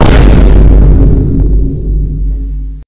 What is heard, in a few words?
vine boom